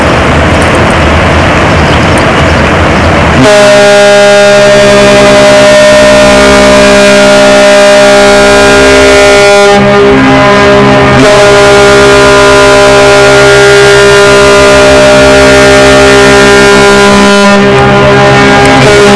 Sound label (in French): Sirene